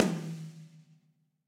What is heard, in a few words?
Tom High Ambient Loud Punch; Drums; Drum Hits; Ableton; Session Drums Studio; MPE